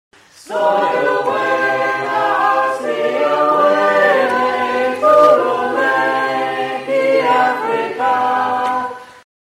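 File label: Soprano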